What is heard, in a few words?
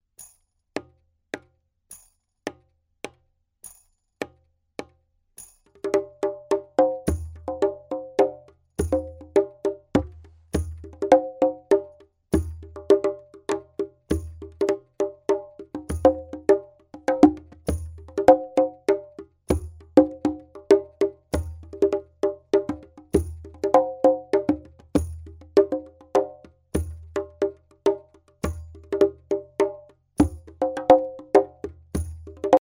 body drum